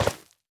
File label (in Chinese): 下界疣踩踏声